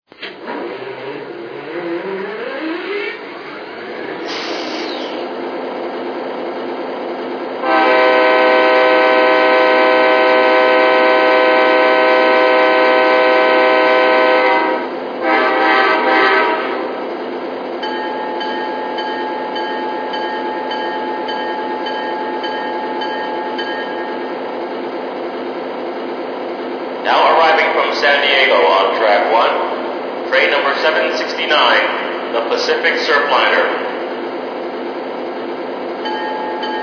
F59PH

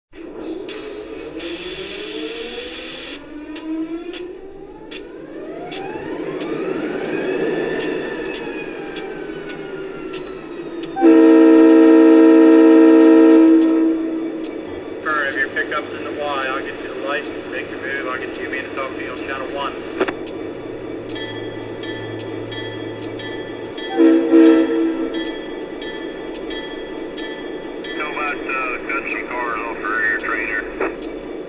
SD40-2

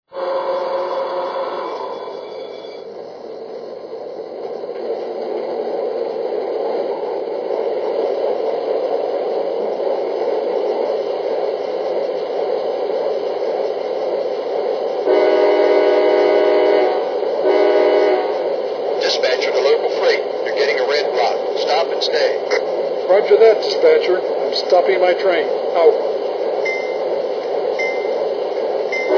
ac6000, trains

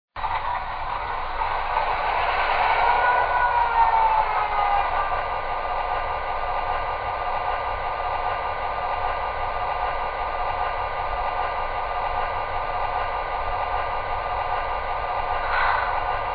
Zephyr

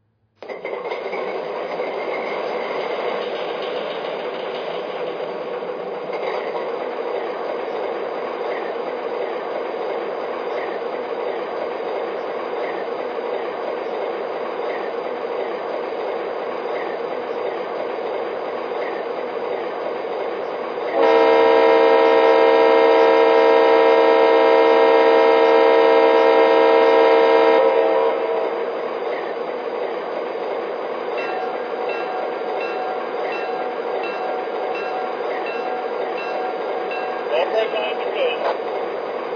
rs3, trains; wm

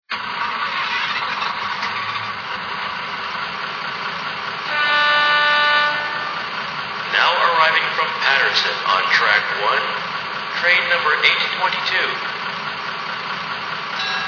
budd; sus

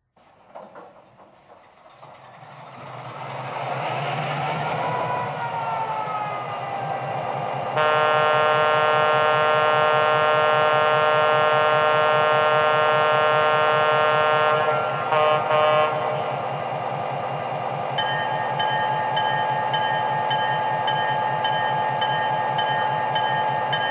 E-3 AA

